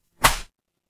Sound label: paper fabric cloth whip